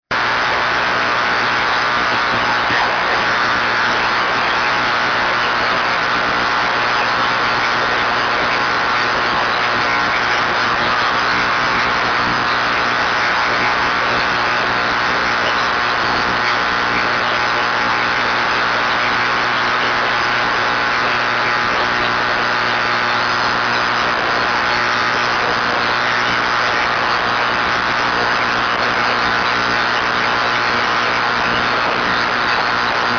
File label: powerline